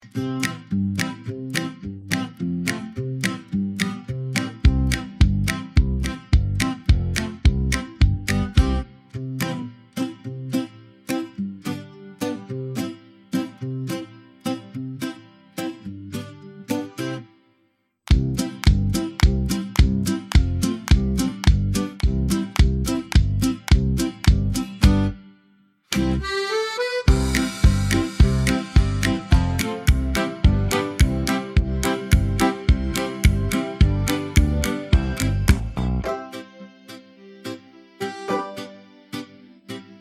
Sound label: moj lolek instrumental